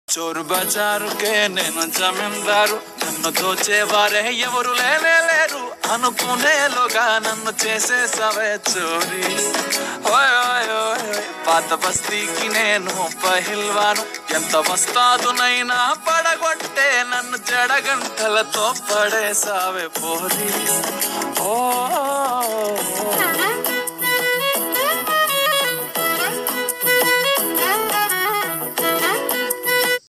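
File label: song background music ringtone; jada mv bgm ringtone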